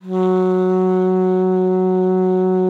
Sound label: F#3, ALTOSAX; Xsample VOL-2